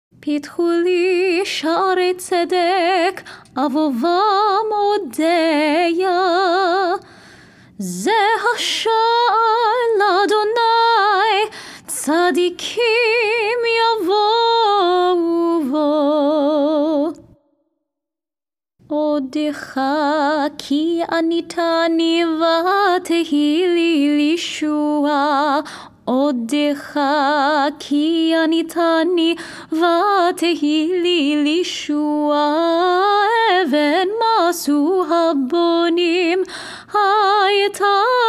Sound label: nusach ukrainian dorian; Festival Shaharit (Higher Voice); pitchu li